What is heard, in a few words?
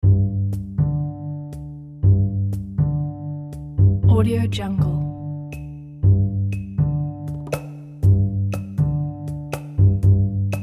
smoking jacket bongo; musicgarden